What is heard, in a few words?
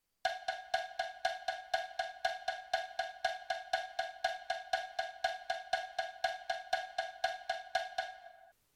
8 note rhythm